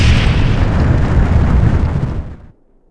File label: magma eruption